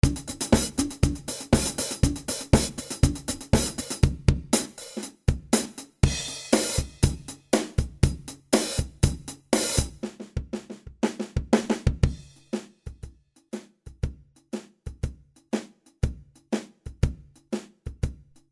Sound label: SI Drums